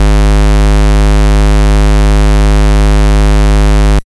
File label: SID Bass G#, chip; CHIPSHOP HITS